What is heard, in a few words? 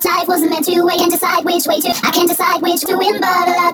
House Loops, Vocal Loops House 128BPM